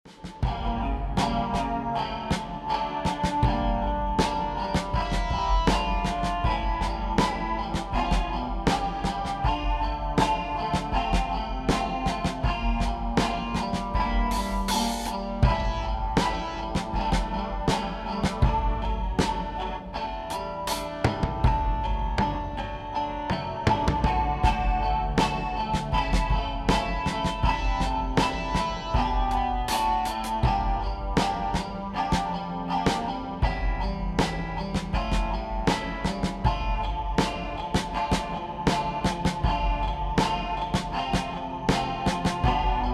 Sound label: drum